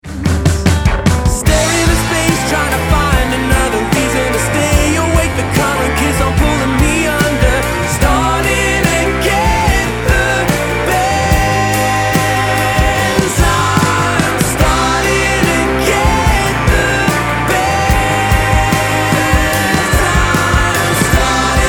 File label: Rock Tenor Harmonies